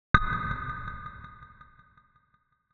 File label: Pull, Stress